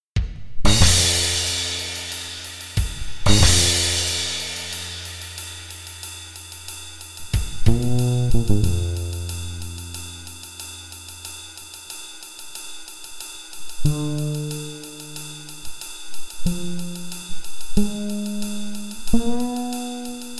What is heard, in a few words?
Two Strings Bass